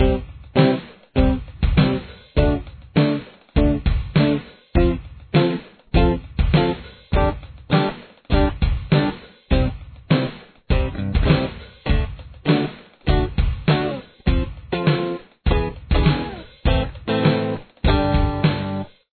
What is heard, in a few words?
chorus guitar